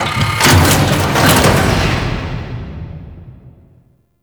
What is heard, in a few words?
Tower Lock Doors; Warframe; Bard Quest; Orokin Tower